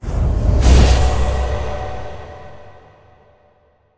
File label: shadow kunai throw; yami